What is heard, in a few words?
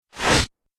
Laser Swish, whoosh; sound design